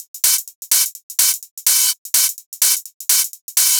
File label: Open Hihats, Cymbals; Vengeance Samples